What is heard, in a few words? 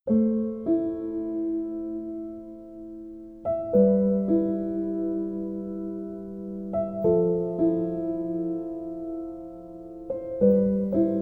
Piano Versiyon